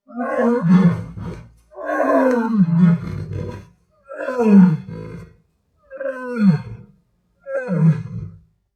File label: Male Lion Roaring